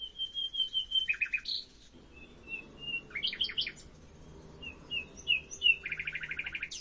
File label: nightingale; last nightingale